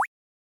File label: step ringtone